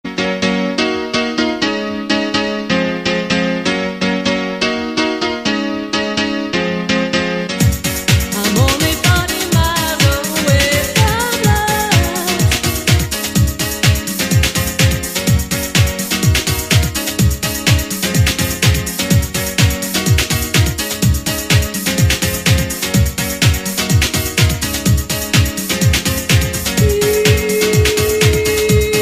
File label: Scary